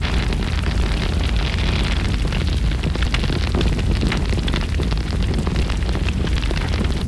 fire big, ambient fire; valve

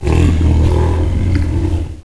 die; valve

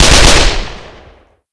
mgun; hgrunt

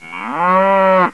moo; valve; ambient; animals

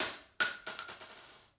shell; valve

